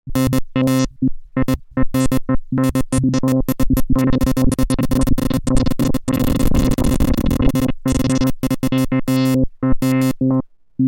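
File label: lfo filter sample and hold, synthesizer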